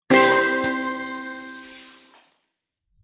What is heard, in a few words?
cake complete